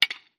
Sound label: Aluminum Bat, baseball; baseball fx & games